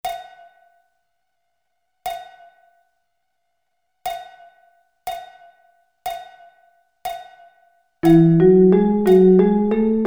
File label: E Double Sticking